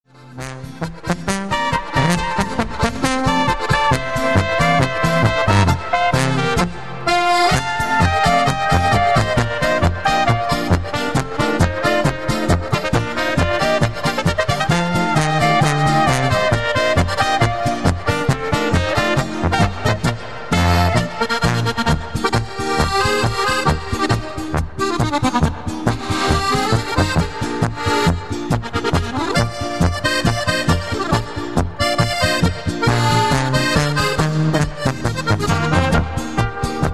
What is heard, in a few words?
polka